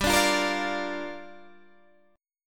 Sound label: G Minor 7th, Guitar, DADGAD